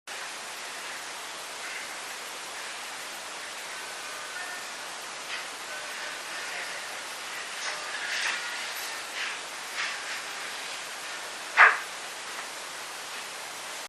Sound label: music